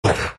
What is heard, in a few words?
big pain; Dota 2; Undying